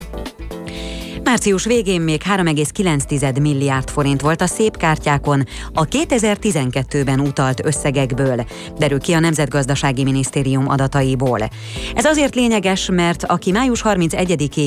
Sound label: Jazzy radio